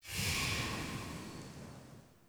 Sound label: UI Faction Choice Rollover